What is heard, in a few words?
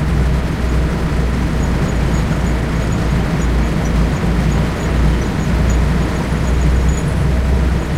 m5a engine int rpm 99, tanks; engines; War Thunder